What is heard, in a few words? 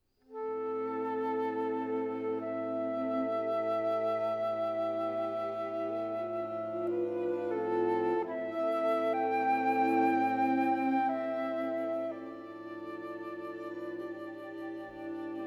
for flute & string quartet; Roussel; Complete Chamber Music